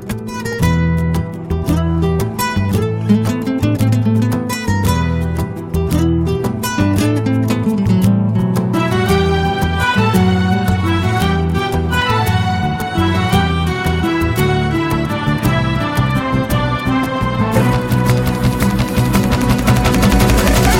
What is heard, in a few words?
Theme Musiq Telugu Ringtone; Manam